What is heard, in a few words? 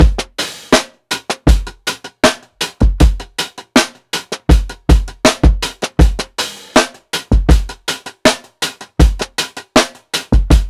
PICCOLO 80 16TH BEAT, DRUMS LOOPS